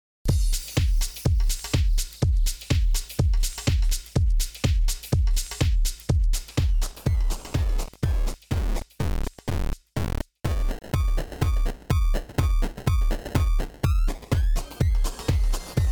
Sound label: Novation Twitch Bit Crusher